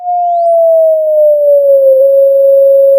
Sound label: Sound of a police car siren